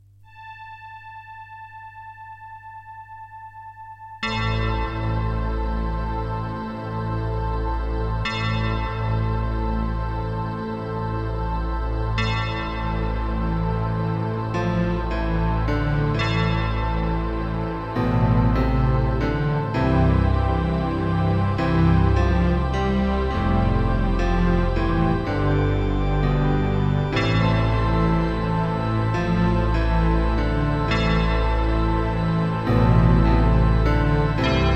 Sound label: Terratec MiniWaveSystem WT64; Gabriel Knight 1